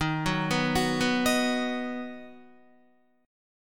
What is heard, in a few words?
D sharp Minor Sharp 5th D sharp down Guitar; arpeggiated; D#m#5; JGuitar